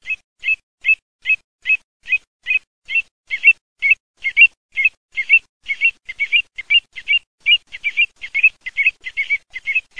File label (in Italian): OCCHIONE, birdsound